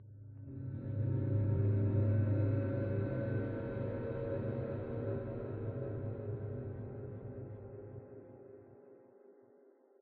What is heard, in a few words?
Npc Combat Echoing Pad